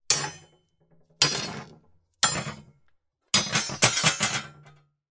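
impact metal steel roof brace strips hits x3 then drop on wooden floor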